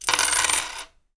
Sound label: coins